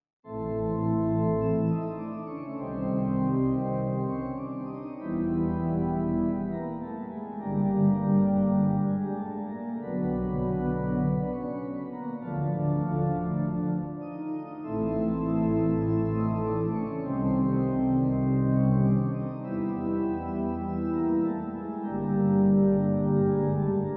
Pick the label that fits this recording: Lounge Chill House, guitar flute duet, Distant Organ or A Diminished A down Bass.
Distant Organ